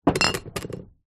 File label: foley glass bottle down on dirty table with others